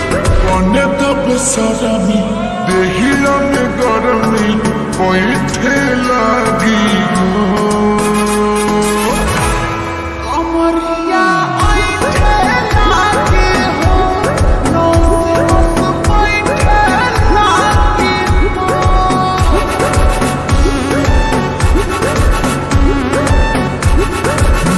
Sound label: slow lofi